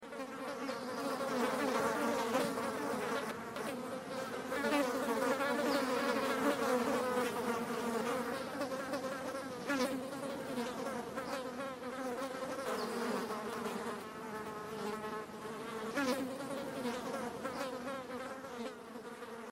Flies Small Swarm; don t be afraid the first toy

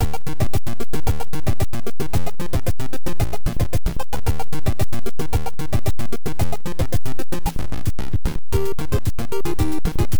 Underwater Level; Kirby's Adventure; Orange Ocean

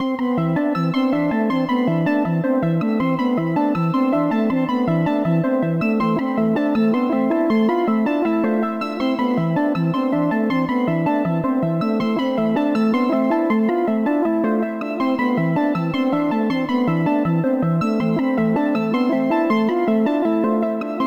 tension piano tense; the warriors 2005 ps2

